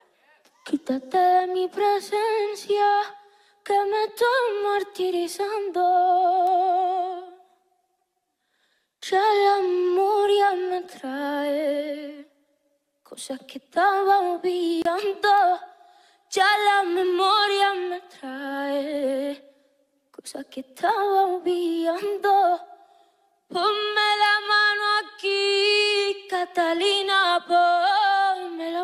TEXTURAS MUSICALES

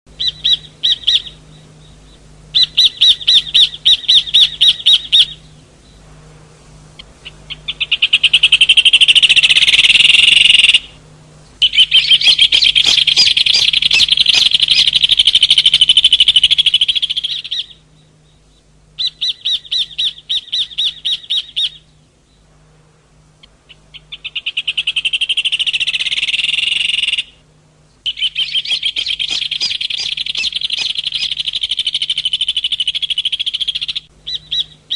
Canto del Hornero

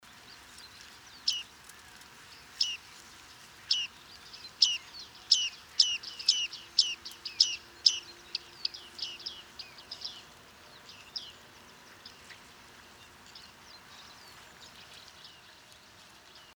Bigotudo, Panurus biarmicus

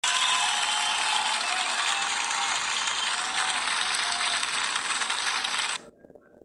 aplausos

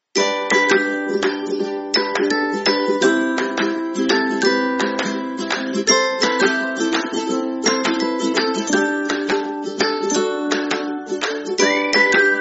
Música de Fondo Alegre y Divertida para Videos, Música instrumental Alegre

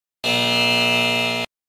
Efecto error

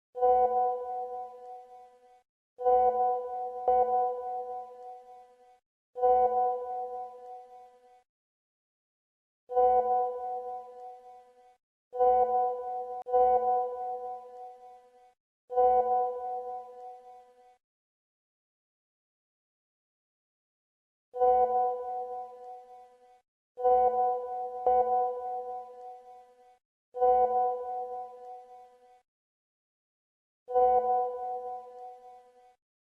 SONIDO DE WHATSAPP; SONIDO DE BROMAS

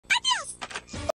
Burbuja

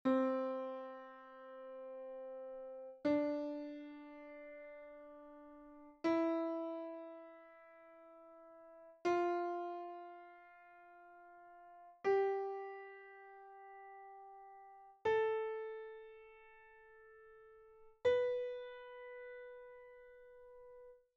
ESCALA

